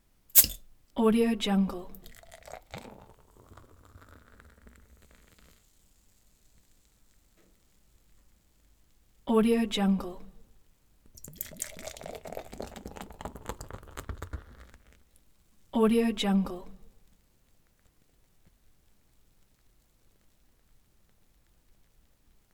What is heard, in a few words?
pour beer